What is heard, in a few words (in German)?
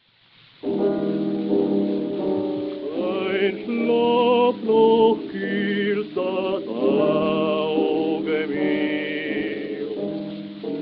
Grammophon